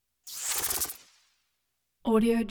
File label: fluttery static pass by